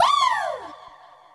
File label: woo